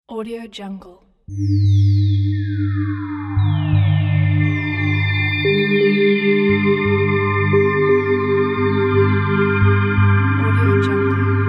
melancholy

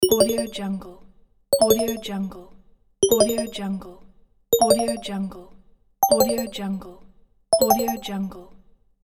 notification alert

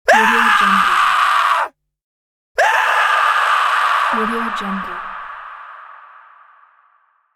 scream

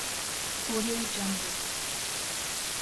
scifi laser cutter tool loop

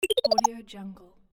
infographic calculation

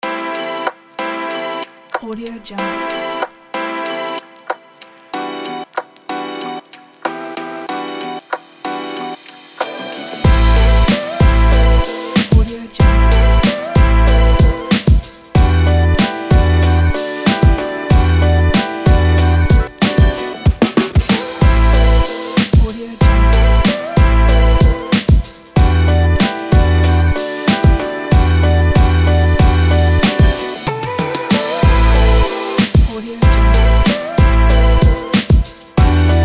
hip hop urban

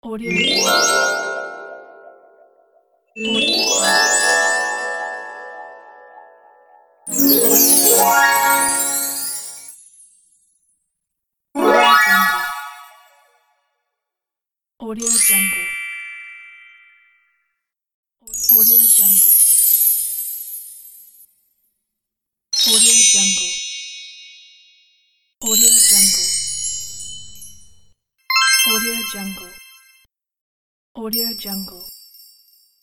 magic zing; magic zing pack

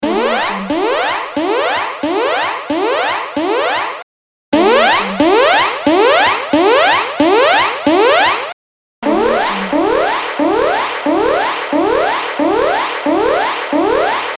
danger alarm

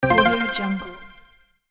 decision button system sound menus

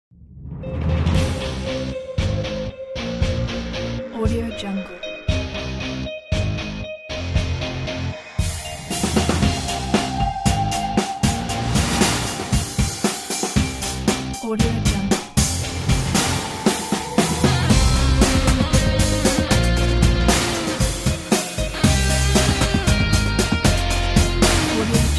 rock things trailer

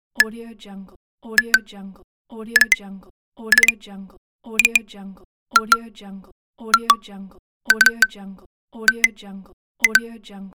digital chat sounds

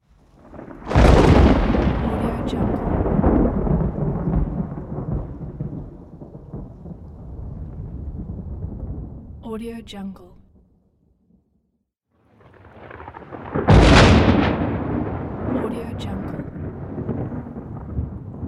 thunder

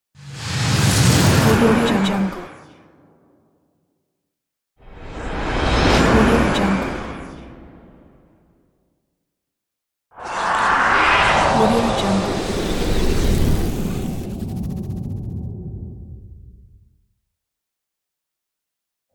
slow whoosh pack

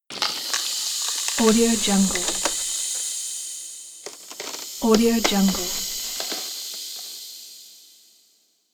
acid on plastic; marburymedia

